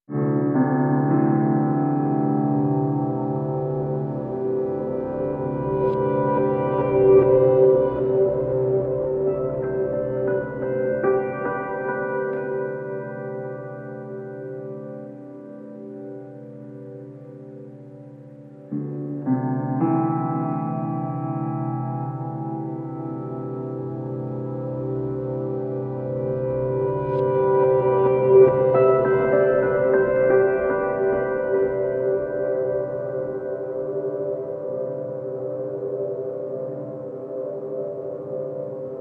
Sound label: Ambient